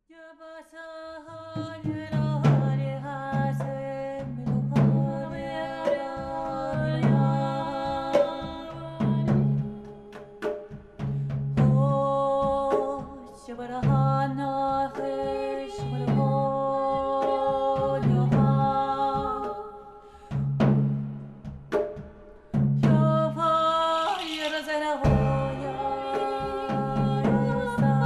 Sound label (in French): Boucle percu